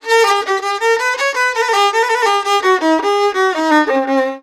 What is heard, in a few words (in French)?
VIOLON, Arabian Traditions; USB Soundscan vol.43; AKAI